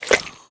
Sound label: breath out, headcrab black; creatures